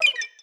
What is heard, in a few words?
match unready